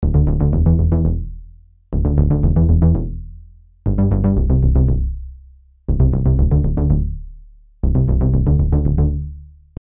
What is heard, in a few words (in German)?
80er Bass